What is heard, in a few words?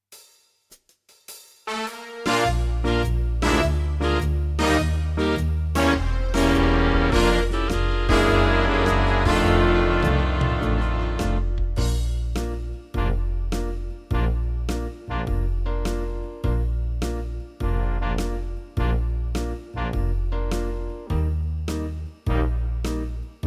C Bass Blues Bb